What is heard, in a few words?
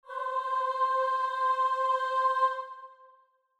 starting note, voice; merrily we sing